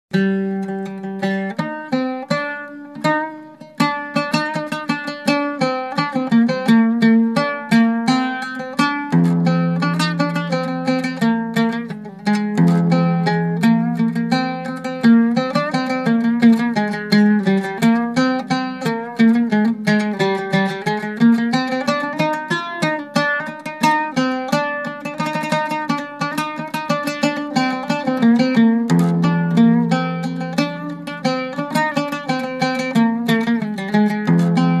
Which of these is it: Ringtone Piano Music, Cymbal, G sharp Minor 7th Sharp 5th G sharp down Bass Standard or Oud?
Oud